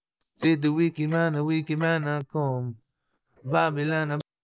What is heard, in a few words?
noisy, vocal; sgmse